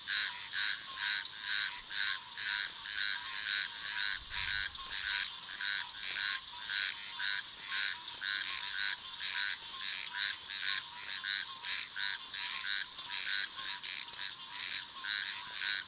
Swamp Frog; Levels Hub Swamp 02 Cursor Potion; Hub Swamp Frog Amb

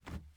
plastic jump

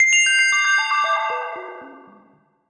jingles Exit area; Smart As...; PS Vita; Music Sharedjingles - Exitarea

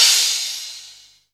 Cym Crash; rainbow laser disco dungeon

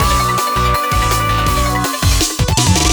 Transition; sky rogue

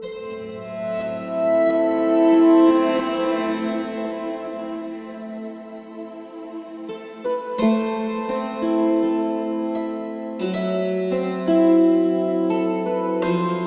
Ingame Garden Melody; umiro android ios macos mobile windows gamerip 2018

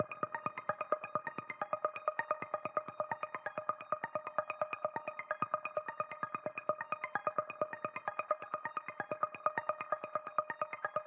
score screen; Ellipsis; Android

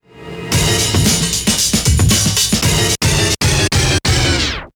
Bgm Time Up; Kotoba no Puzzle Mojipittan Encore; android

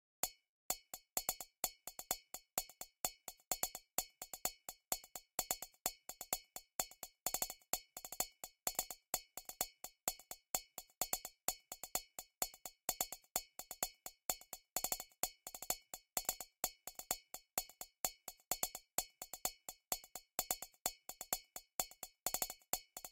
PERC

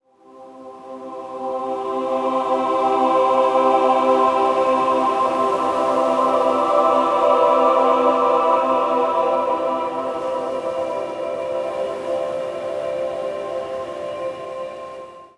ui music; Sudeki

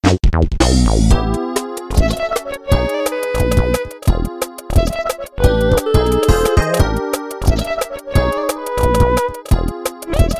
Talent BGM (Impatient)

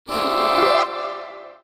Piping Bag; Cookie Jam; Android, iOS, mobile, online, Windows

